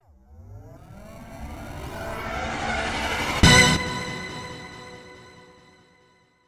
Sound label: ramp open; ksl